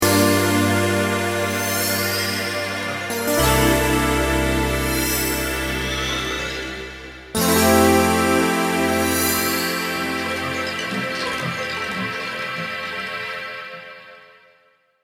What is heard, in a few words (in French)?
Synthe D50 son; Electronique